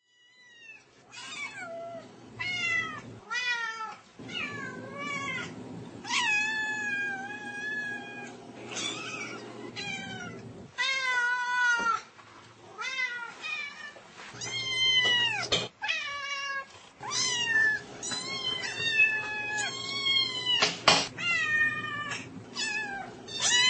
cats meowing

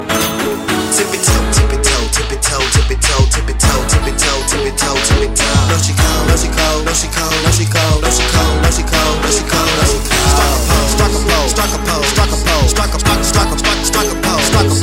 tip toe waltz, ringtones

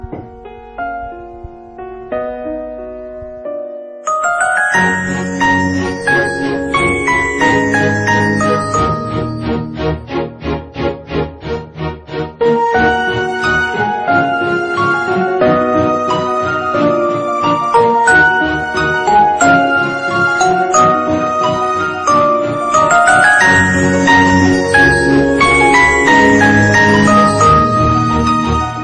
instrumental; classical

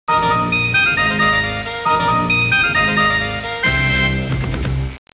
Equalizer Jingle

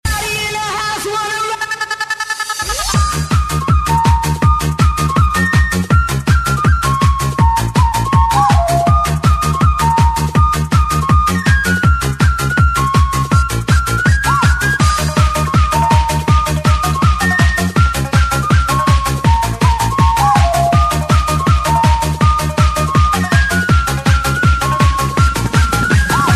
party house

